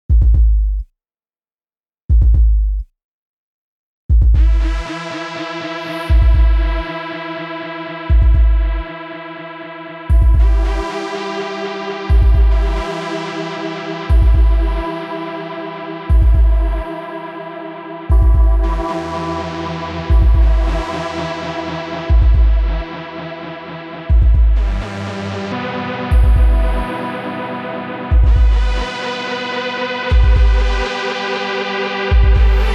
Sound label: Techno